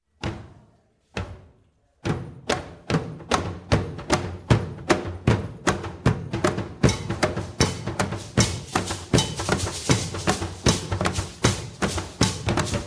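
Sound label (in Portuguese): toada para iansa, terreiro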